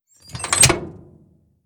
Lever Push; moul-assets

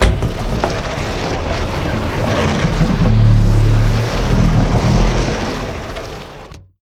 Door Open; moul

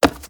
Stone Run

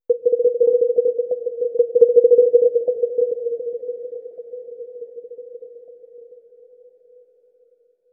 Forest Amb; moul

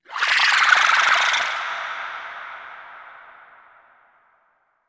Scream mono

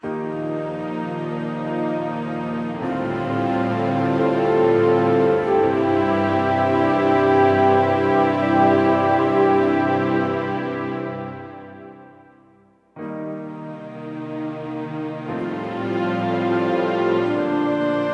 Library Interior Music

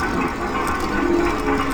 Dock Handle loop

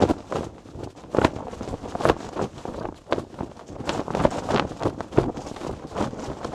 Flag Flap loop

